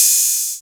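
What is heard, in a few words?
R8 crash; X-Static Goldmine 1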